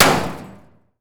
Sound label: bullet metal auto; physics